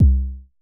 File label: Basic Kick C2, Dirty Kicks, Vermona Kick Lancet; LookIMadeAThing Kicks